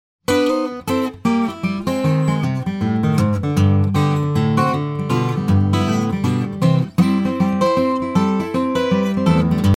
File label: Slow Drag